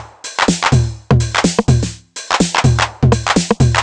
drum niner top 125, Drum Loops, Classic Chicago House